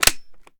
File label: reload mag in, weapons; gmod; viper